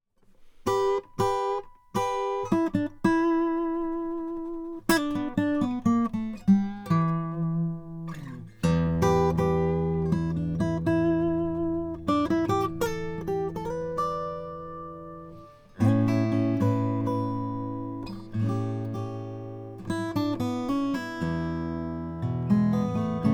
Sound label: martin ceo 7; sunburst